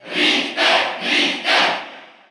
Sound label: Cheer Russian; SSBU